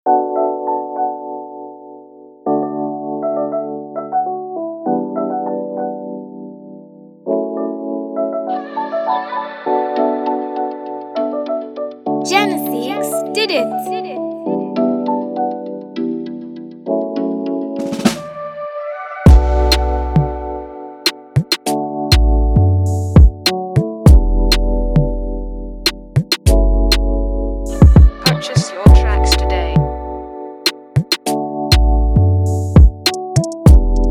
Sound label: Afrogbedu, Afrobeat instrumental